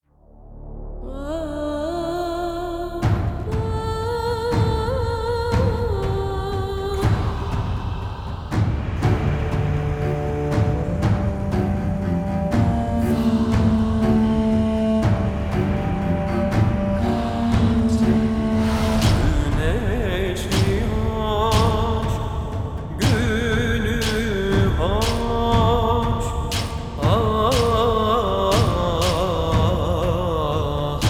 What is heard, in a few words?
Undead Amb; Disciples III Reincarnation